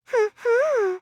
"hmhm"; monophanie

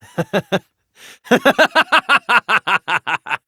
laugh

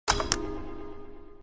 chime; va-11

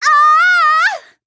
"aaaah!"; miu

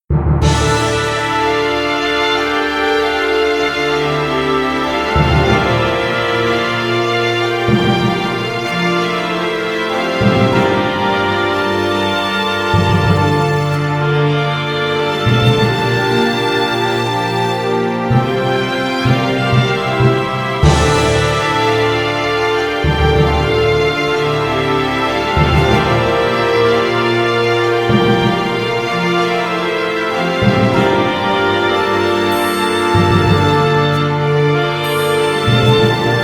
trial (orchestra)

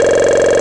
bubbles; aai cammy

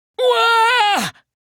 wahhh; monosuke

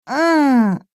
pout

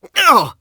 heavy pain; sonic; vc roger

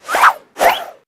sword crazy

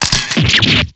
damage

